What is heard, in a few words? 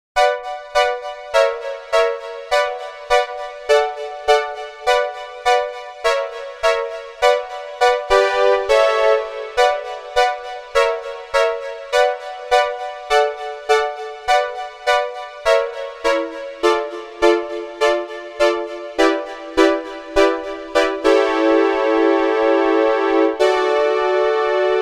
Synth Brass